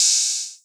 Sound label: Hats, Elektron MachineDrum